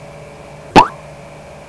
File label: pop, People; Sound Library 2000; Wayzata Technology